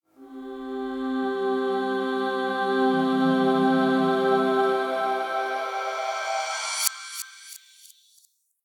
Heaven Voices Intro

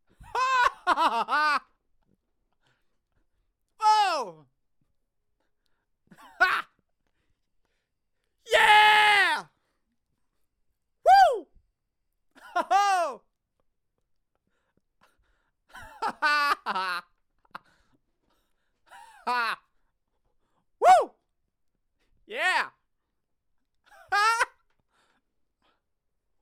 Excited Young Male Screams

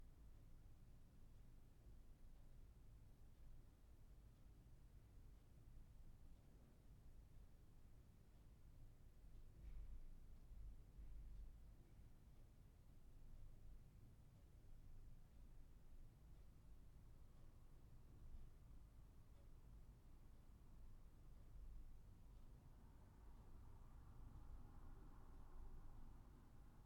Roomtone Empty classroom

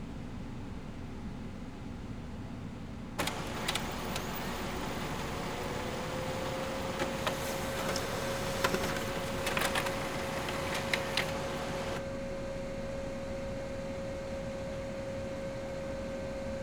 Printer

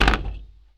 Thump

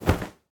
Clothes Drop

